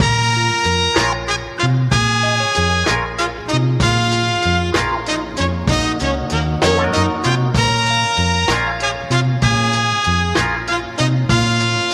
horns, phonky loops

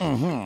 mmm hmm, voice; ooh ahh

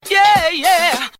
yeah yeah SUNG

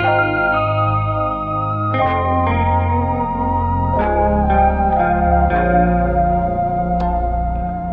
keys relax, Phonky loops

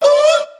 whoop male, voice; ooh ahh; ho hoo woo

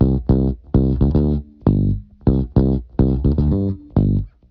bass bus rider; Loops; Phonky

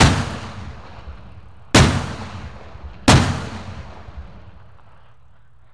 gun shots; Crash Bang Boom

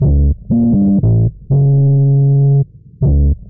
bass

